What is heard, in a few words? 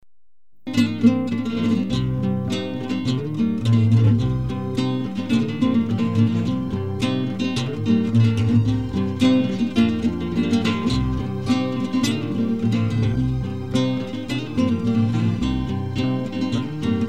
melodie